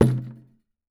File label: KOGUMO, KOREAN DRUMS; AKAI S6000 CD-ROM; WorldSounds